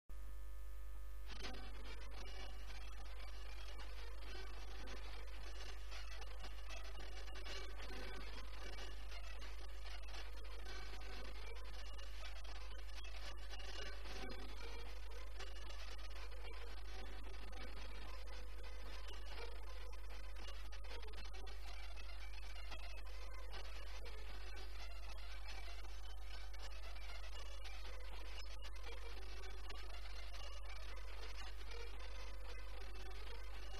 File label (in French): Bourrée